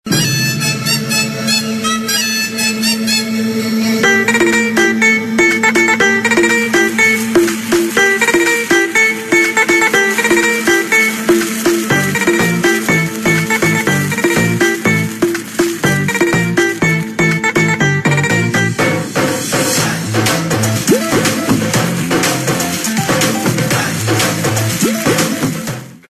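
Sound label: background Tune; Baldev; Tv Serials; Star Plus; Veera Ek Veer Ki Ardaas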